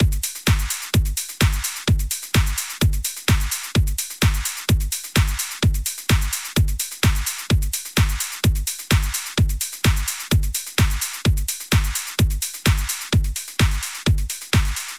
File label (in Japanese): 4つ打ちのリズムの場合は、キックはジャストタイミングでカッチリと合わせて、半拍でクラップを、ハイハットやパーカッションでグルーブ感を出していく形になります, Beat; 4Beat; 基本的なリズム隊（キック、クラップ、ハイハット）; ダンスミュージック